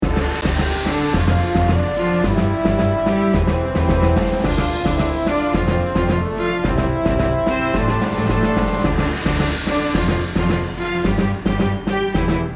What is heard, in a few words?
Boss Battle (Orchestra); dragon fantasy book i the volumes of westeria